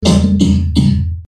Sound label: cough